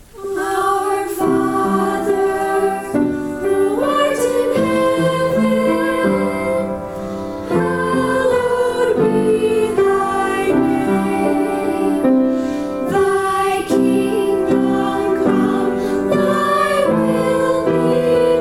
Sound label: lord s prayer vocal and instrumental